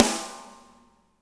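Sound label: snare right; Spectrasonics; Burning Grooves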